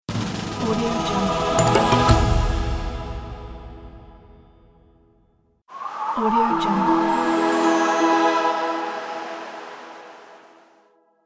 winter choir logos

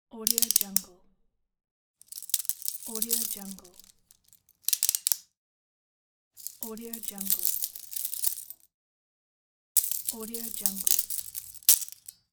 handcuffs

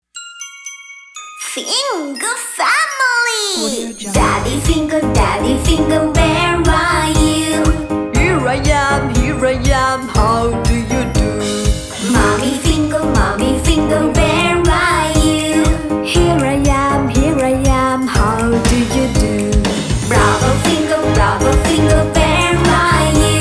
finger family

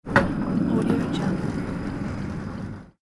heavy slide mechanism

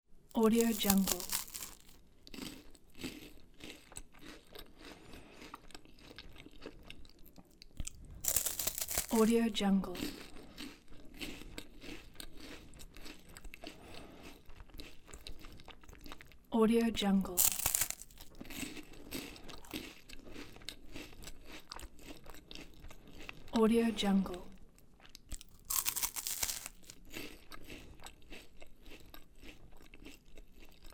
eating crunchy wafers

